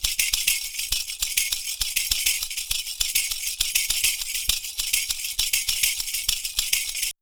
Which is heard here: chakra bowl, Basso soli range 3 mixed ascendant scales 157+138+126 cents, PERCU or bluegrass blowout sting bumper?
PERCU